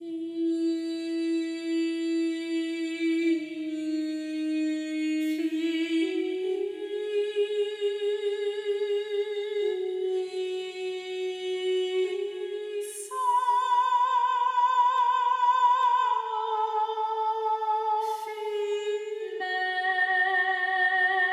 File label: VOCAL PLUG IN ON; KONTAKT THIRD PARTY DEMO SONG